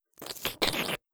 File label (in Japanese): べろん, 効果音